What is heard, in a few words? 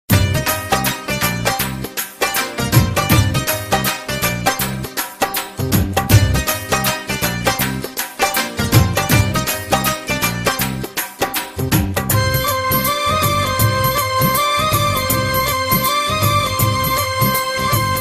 High Bhangra